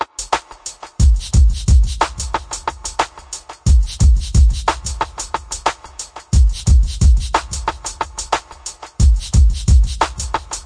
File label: 090 bpm echo rim offbeater, drum loops and breaks